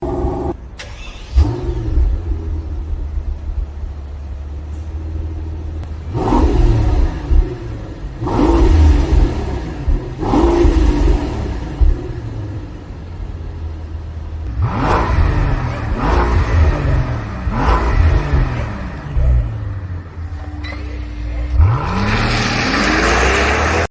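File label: Maybach, exhaust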